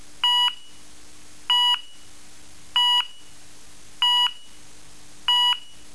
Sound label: Tone Intermittent 970 Hz at 0.8 Hz (250ms On-1s Off)